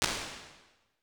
Skindrum Room, impulse responses, medium spaces, rooms; Apple